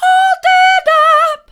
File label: hold it up mid, vocal